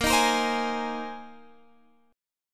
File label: Bb 9th Suspended 4th Bb down Guitar Open F; strummed